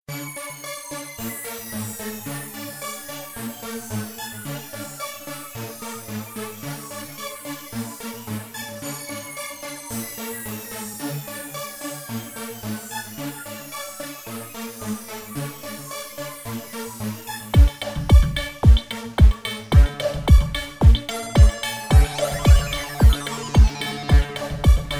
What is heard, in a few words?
energetic flow 432 hz; new style; Nowy styl